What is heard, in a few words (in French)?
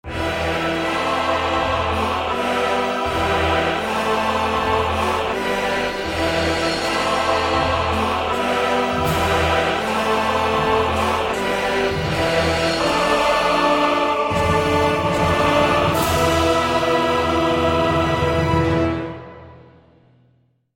choeurs; banques de sons